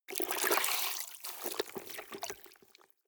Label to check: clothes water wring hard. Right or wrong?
Right